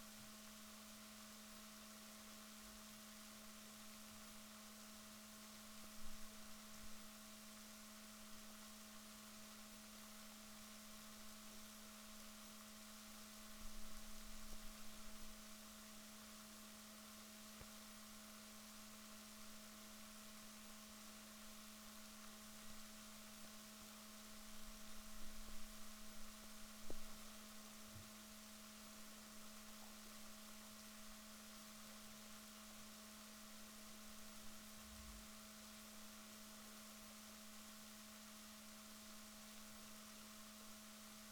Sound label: rorquals negatif, Balaenoptera Physalus Chile